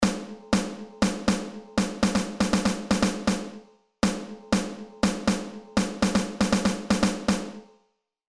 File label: Rhythms Snare Drum